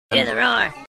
roar; shrek

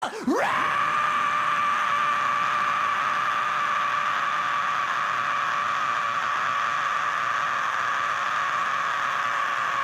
monster scream

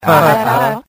laugh button

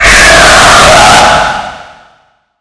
screamer

